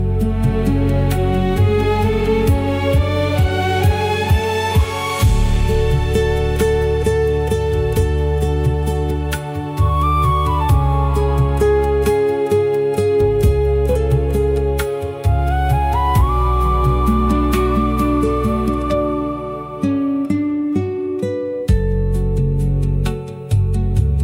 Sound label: ringtone